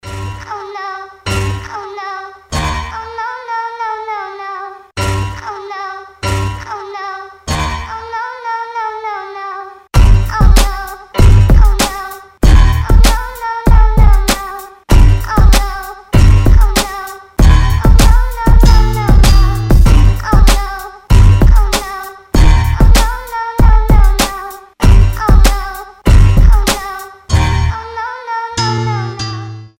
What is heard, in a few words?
instrumental